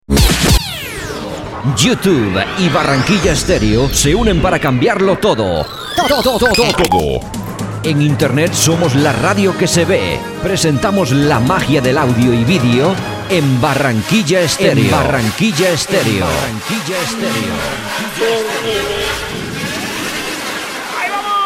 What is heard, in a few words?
jingle; Barranquilla stereo